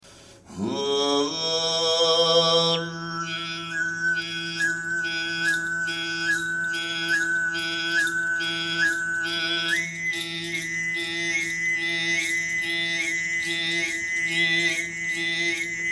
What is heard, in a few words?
ezenggileer bells